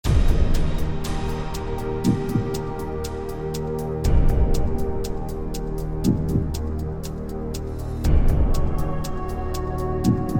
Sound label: Clock; Millionaire Soundtrack; US Clock (2008)